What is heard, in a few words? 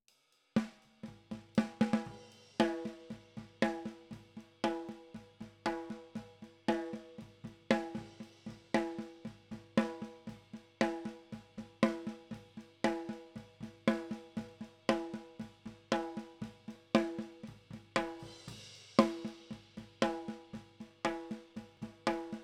Snare